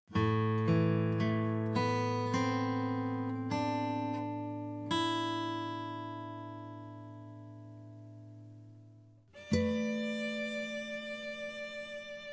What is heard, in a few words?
acoustic duo